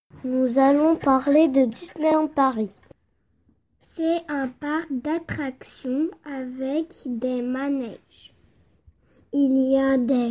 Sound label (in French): vocal